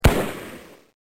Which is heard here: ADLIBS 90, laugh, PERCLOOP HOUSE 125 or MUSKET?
MUSKET